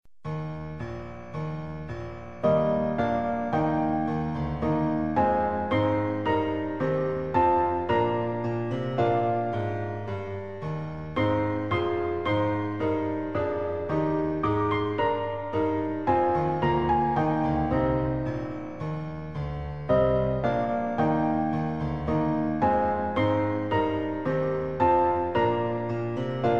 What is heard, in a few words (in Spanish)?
Himno de Andalucía